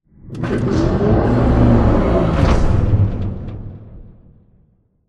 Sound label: Robot Arm Angle Back Close; Boomtown; Titanfall 2